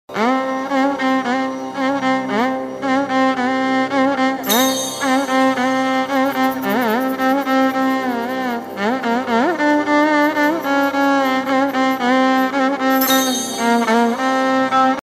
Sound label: ringtone instrumental